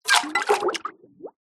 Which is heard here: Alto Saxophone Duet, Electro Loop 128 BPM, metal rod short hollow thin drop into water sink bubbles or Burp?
metal rod short hollow thin drop into water sink bubbles